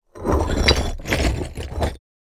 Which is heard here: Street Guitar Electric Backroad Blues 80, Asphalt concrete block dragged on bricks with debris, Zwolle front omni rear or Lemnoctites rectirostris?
Asphalt concrete block dragged on bricks with debris